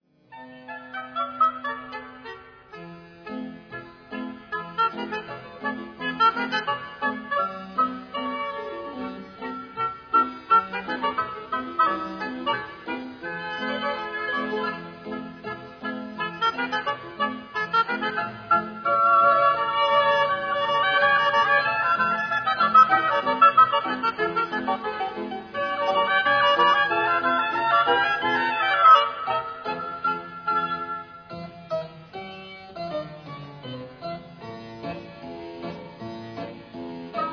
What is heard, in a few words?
Polka, oboe